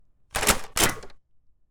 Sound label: Shotgun Pump